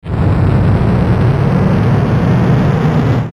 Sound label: Ascend Static Hard Distortion; Blastwave